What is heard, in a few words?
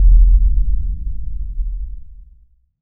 EARTH KIK; Distored Reality